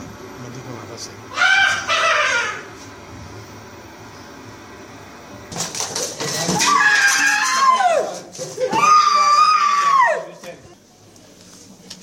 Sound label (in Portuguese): risos e gritos